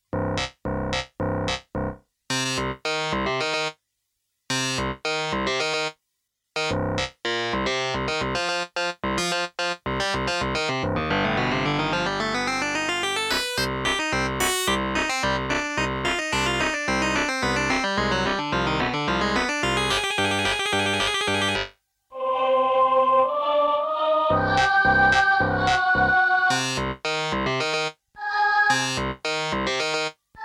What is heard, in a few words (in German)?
zwischenmusik